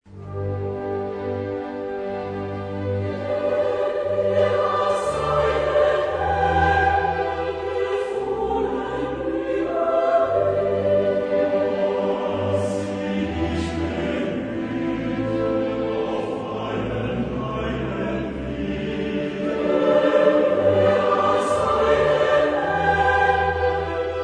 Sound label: Oratorio, chorus; Mendelssohn; Elijah Op70; Denn er hat seinen Engeln